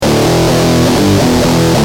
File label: Guitar, Industrial